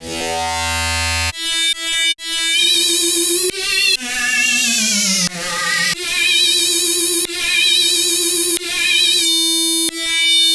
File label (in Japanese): エレピ